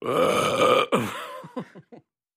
Lrg Airy Belch Laug, belching

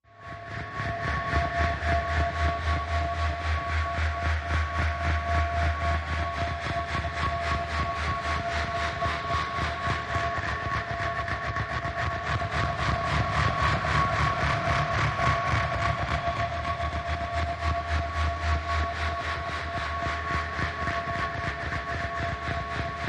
Dread Inducer Machine, electrical

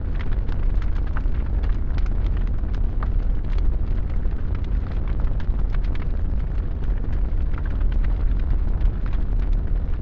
Inferno; crackles

